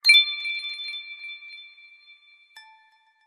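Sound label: notification info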